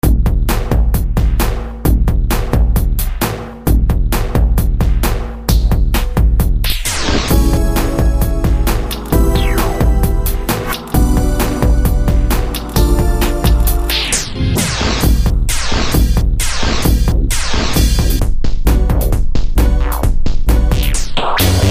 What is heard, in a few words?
subversive techno kit